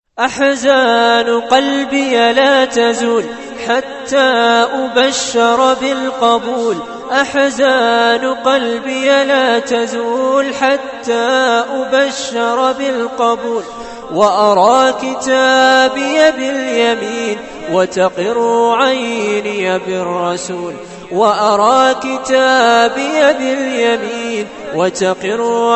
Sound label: Ahzan